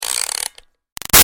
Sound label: Toy Music Box Wind Up Fast; Wind Up Toys (Sound Effects:Toys); Big Room Sound